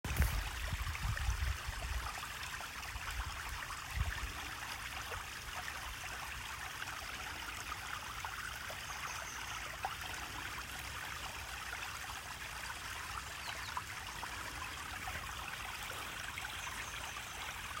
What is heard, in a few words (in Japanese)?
seseragi; yatsutgatake